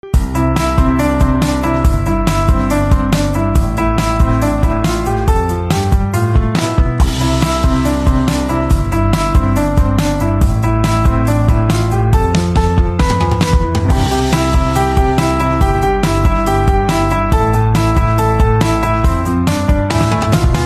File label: Piano Instrumental Ringtone